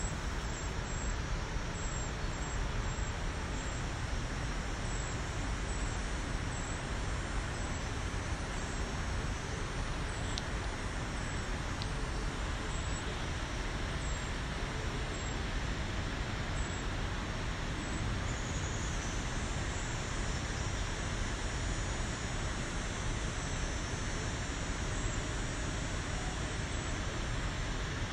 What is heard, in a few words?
Mississippi River Soundscape; Minneapolis